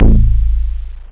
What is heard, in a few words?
anim bass, synth bass; da capo vol1